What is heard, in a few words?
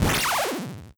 paralyze